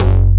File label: Bass Cuts; Vengeance Samples; VES1 Instruments